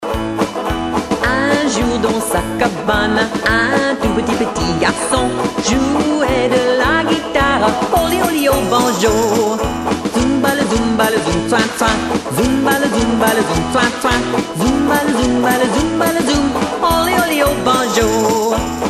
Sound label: banjo